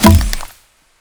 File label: Shoot